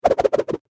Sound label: Foley Whip Whoosh Multiple Fast Throw; Whips; Sound Design